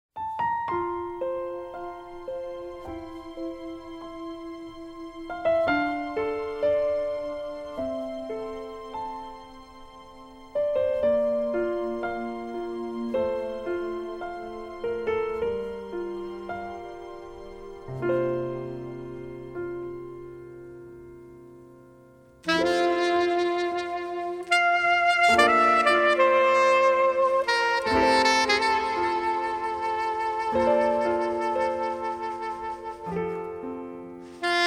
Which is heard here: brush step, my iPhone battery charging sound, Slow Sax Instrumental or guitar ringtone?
Slow Sax Instrumental